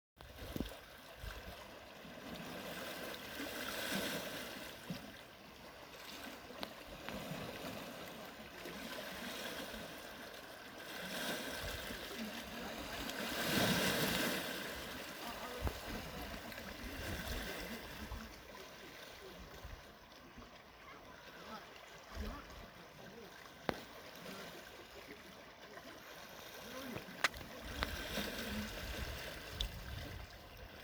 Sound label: Sea sound